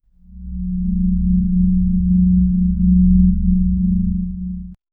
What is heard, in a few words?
low eerie drone for subtl, tension